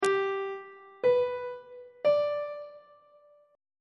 e minor chord 3 is g major on treble clef

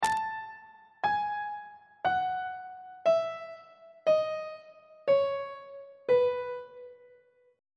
b mixolydian mode descending on treble clef

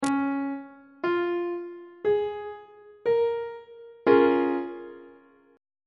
d flat major 6th chord; on treble clef

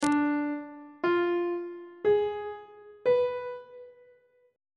d diminished 7th chord intervals; treble clef

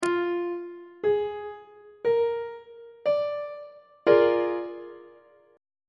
b flat dominant 7th 2nd inversion on treble clef

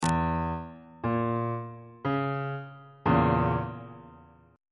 b flat diminished 2nd inversion on bass clef

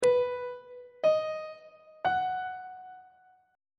b major chord 1 is b major on treble clef

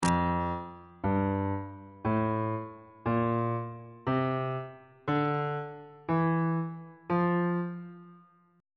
f major note positions

